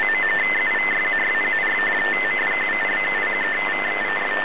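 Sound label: 8 MHz USB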